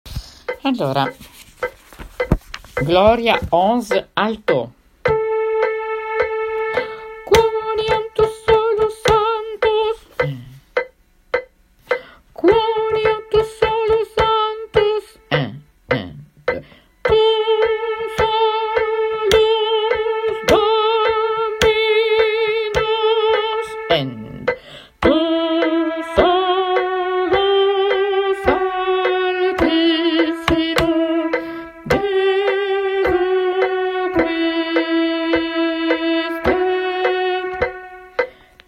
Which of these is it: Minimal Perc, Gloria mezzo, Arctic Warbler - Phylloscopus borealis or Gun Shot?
Gloria mezzo